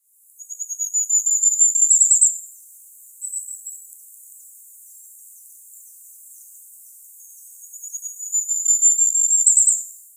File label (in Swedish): Brandkronad kungsfagel; Firecrest; Regulus ignicapilla; Volker Arnold